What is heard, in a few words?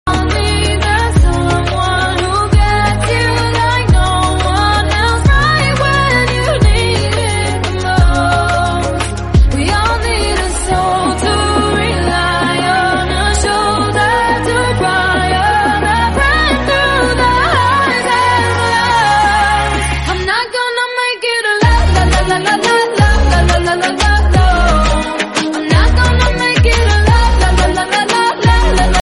sad English song tone ringtone; english ringtones